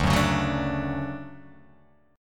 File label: D Major 7th, chord